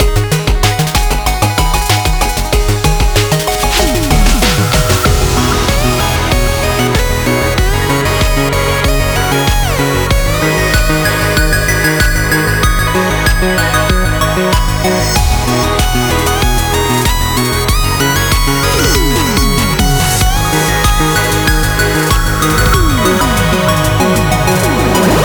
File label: chorus